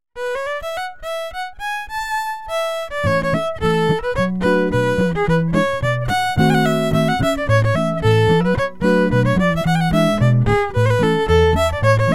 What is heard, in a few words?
Horse drawn troley ploka